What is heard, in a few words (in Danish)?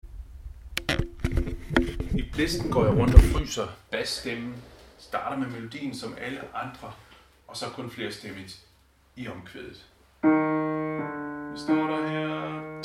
bas